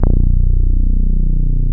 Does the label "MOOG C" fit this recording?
Yes